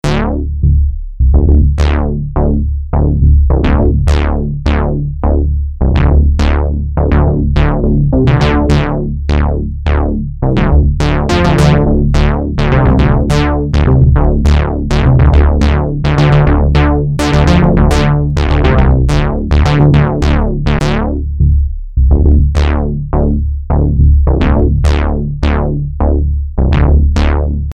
Bass

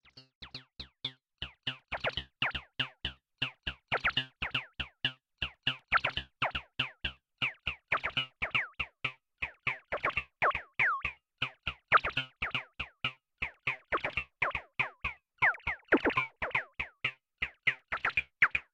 Morpheus Cable RIng; Rossum Electro Music

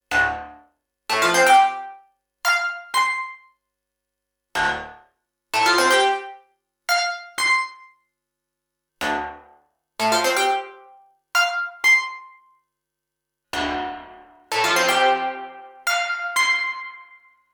modal; logidy epsi

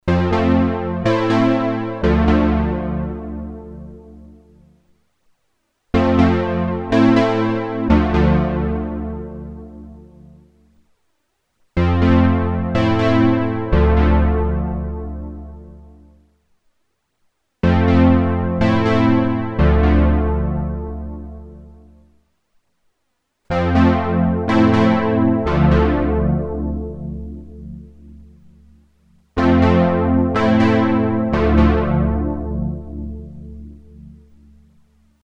Roland JU06 Roland Juno106 Roland Juno60 Accord

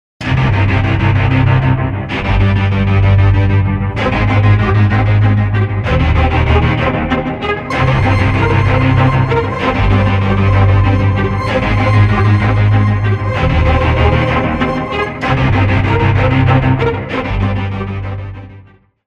Triplet All Ambient

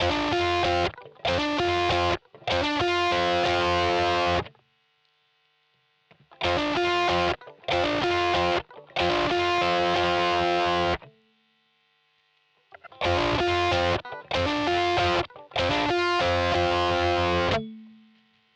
Simplifier DLX MS Brit KT 88 EL34 6L

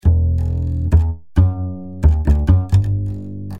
upbass A 8th

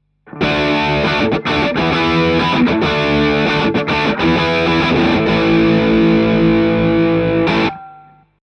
HPF Guitar Amp Crunch; Midas 502 V2; 12 oclock; Extinct Audio Black Ops

